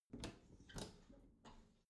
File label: bathroom cabinet open soft squeak; objekte; tueren